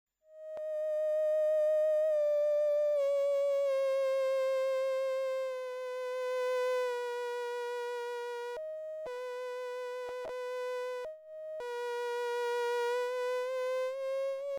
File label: theremin slow, freqbox moogerfooger; Theremin Moog epro – legato; VCO: 0 SYNC: SI WAVE:tri ENV AMT: 0 FM AMT: 0 MIX 4; notare il salto di nota che si ottiene tra volumi bassi e alti; MF-107